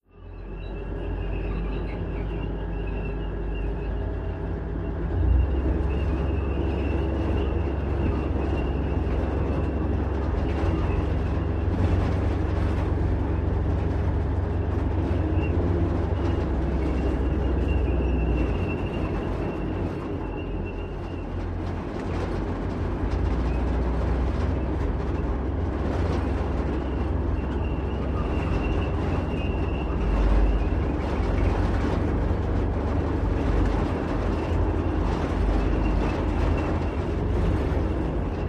M-60 Tank: Interior Idle, Drive Around With Rattles And Bangs. Stereo